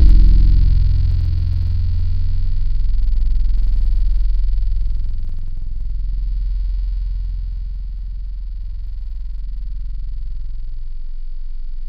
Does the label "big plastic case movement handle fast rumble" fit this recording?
No